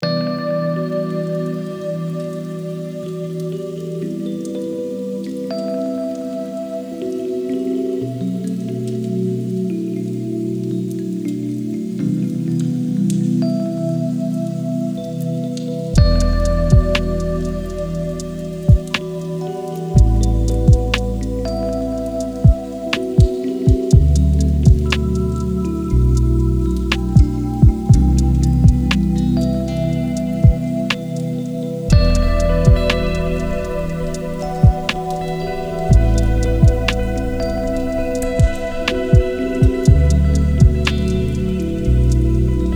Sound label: lofi hip hop